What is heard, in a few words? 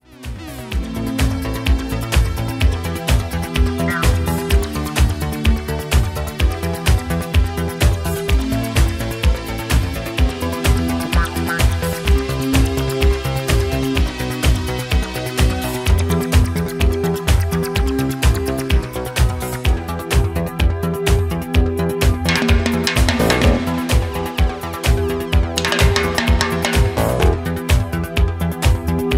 disco